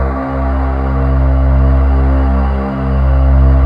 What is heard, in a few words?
MINIMOOG; Rubber Chicken Software Sample Bank; OLD SYNTHS